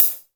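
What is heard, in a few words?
hi-hat, drums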